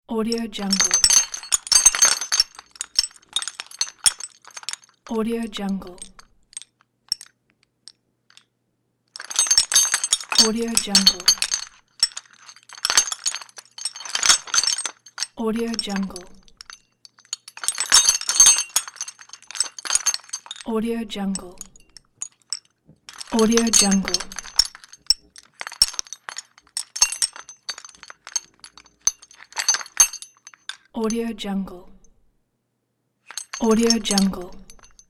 chains clanking

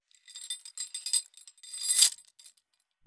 pitched just chains tighten whip swing; soundbits-soundfx

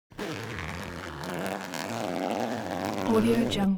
foley zipper down jacket open slow

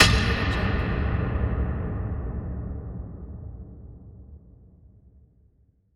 deep cinematic impact

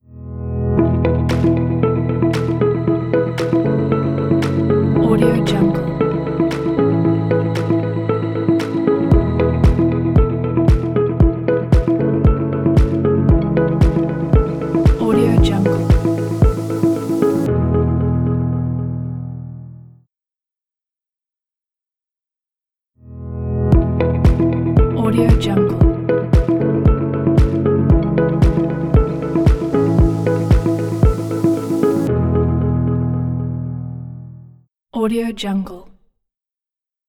simple corporate logo

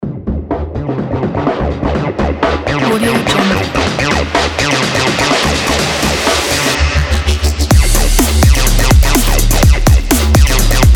sport breakbeat; scorpioon

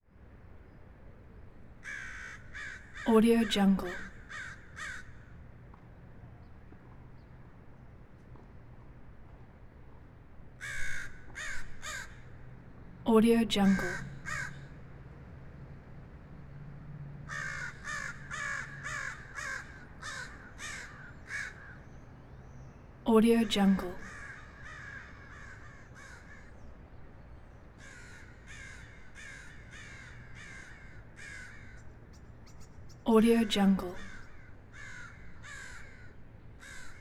crow caw distant circling traffic; prosoundfx

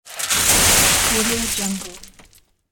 course gravel impact in wheelbarrow; sound-ideas

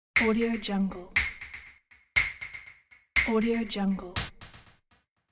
game start countdown

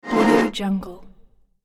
cartoon magic suction

